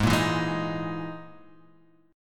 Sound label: E Major 7th Flat 5th G sharp